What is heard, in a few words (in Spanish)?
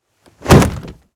CAIDA CUERPO